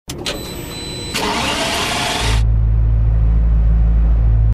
start, vehicles tt

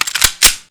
fiveseven clip in; usp

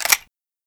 hammer, weapons, remington

